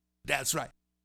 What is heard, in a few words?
Vocal, 70s Analog Funk